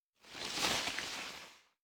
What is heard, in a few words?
deity cocoon close